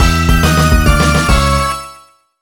Jingle Ko; zombiez seeker; nds

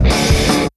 Exiting Vehicle Jingle; The Simpsons Hit & Run; PS2

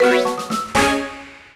item get; Yokai Watch Busters 2 Hihou Densetsu Banbarayaa Magnum Sword

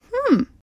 Emote Female Confused; Idle Champions of the Forgotten Realms

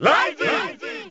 Logo; Raizing

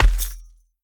Footsteps Dirt Run; The Town

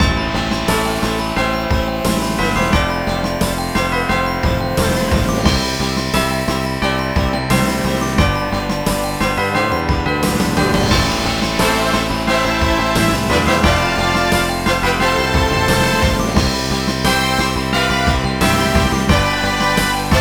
Lobby; Cookie Run OvenBreak

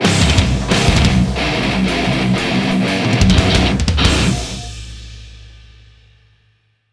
Level Up; modern combat 3 fallen nation; android

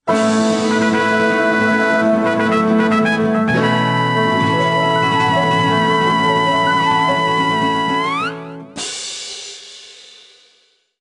Jingle Gradeup Fanfare; tamagotchi connection corner shop ds

